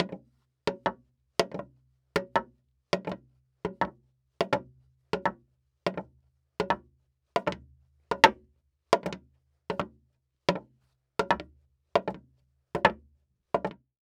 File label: Foley Footstep Cowboy Boots Walking On Wood Board Walk; Foley Footsteps